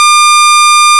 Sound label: 1200 Hz Continuous; SmartVOX